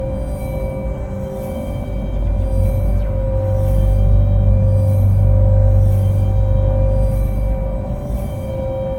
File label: monolith hum loop